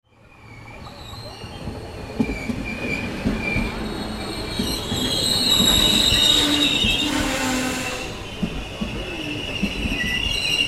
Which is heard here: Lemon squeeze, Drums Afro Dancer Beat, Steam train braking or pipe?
Steam train braking